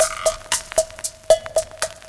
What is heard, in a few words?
Bossa Back 115 bpm, breaks; Zero-G Beneath the Planet of the Breaks